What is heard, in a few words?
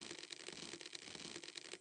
bow string; Illusion UE5